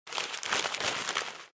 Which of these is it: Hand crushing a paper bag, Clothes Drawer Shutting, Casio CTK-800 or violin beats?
Hand crushing a paper bag